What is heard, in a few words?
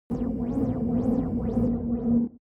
sci-fi space insect colony